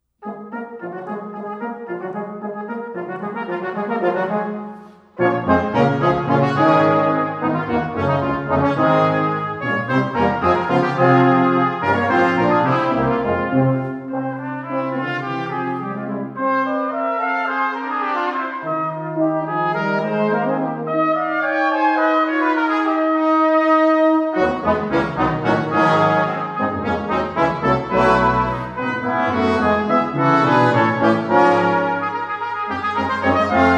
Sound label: Brass Quintet In D Flat Major Vivo